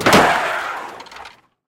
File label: drift